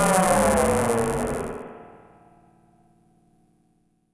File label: FX, EMS VCS3